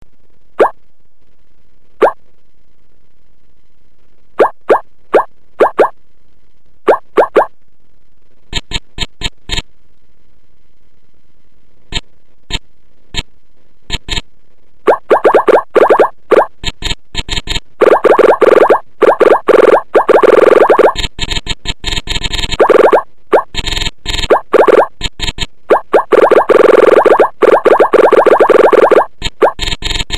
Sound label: beep tekno